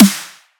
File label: Dubstep Snare